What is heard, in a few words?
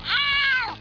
meowl; The Big Toothache